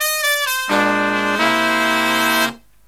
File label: Killer Brass Riffs